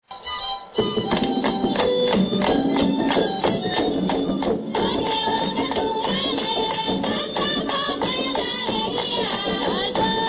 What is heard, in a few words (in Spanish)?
tamborito